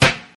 Good Steel Snare Drum C# Key, Royality free snare sample tuned to the C# note